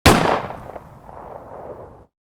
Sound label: Realistic Gunshot Sound Effect